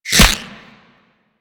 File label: Ghost Expel Spirit Impact; Warframe; Priest Quest; Rell Ghost